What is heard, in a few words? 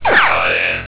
phasers; VitalWatch; paladin